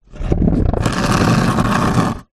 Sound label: sport skateboard scrape on hard ground